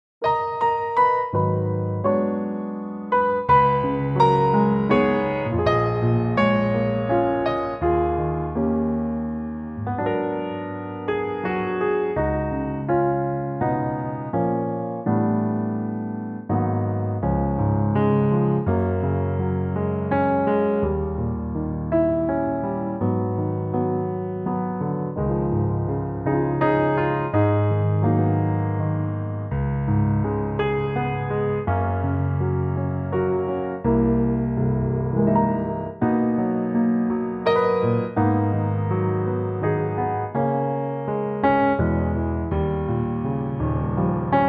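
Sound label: Gb Piano, Backing Tracks for Male Vocals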